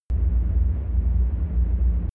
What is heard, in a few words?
drone spaceship large rumble